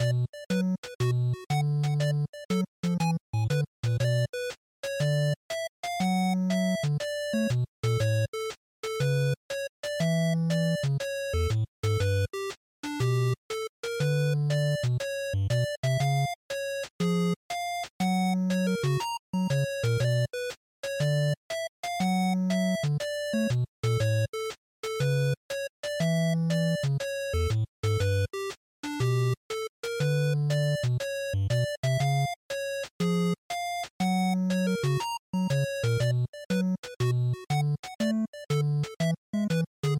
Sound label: NES RPG Dungeon Loop120